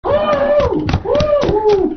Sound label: woo hooo